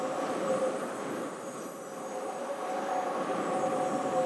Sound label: capsule loop; clash